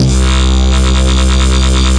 Synthesizer Middle C Notes, Lead

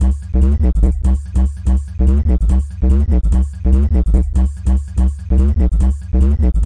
down there bass

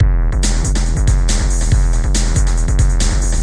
140 bpm, heavy breakbeat, drum loops and breaks; 131 to 140 bpm; samples-ghost

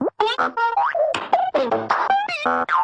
vintage boinks blinks hits, Cartoon Sound Effects; Cartoon Sound Effects Kit

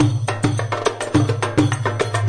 105 middle east baio, drum loops and breaks; 101 to 110 bpm

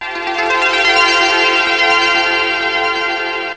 happy sweep, Synth

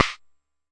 Jomox MBrane, drum machines; Jomox MBrane 11

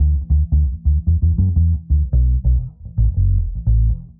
bass

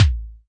Pearl SC-40 Kicks

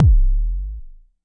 Jomox MBase, drum machine; Jomox MBase 01

